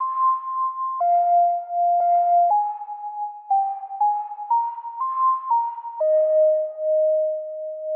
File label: 120 thin bell like melody; SYNTH AND ELECTRONIC LOOPS